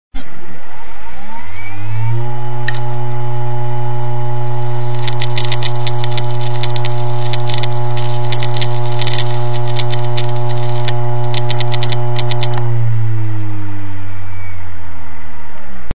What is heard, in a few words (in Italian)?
Seagate – testina atterrata sul disco, dying heads spin down, hard disk